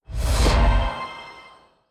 Achievement complete; Terraria

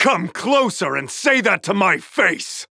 Taking Damage

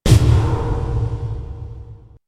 Flicker

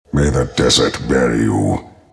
attack; Sand King

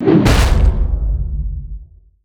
Totem attack; Enchant

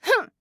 Grunt Attack; Assassin

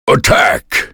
attack; Awesomenauts; Smiles

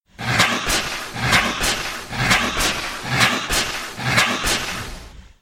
attack; Viper

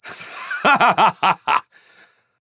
Laugh; paladins; Corvus

